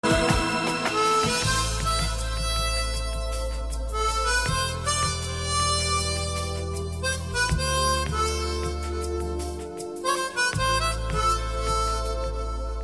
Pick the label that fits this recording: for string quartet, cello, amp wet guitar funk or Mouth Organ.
Mouth Organ